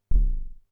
bd hip, Drum Machines, Formanta Radio Plant Polivoks